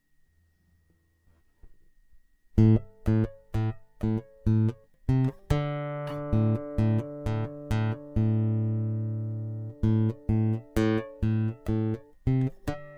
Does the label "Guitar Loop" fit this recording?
Yes